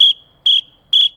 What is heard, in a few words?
whistle, Cartoon Film Effects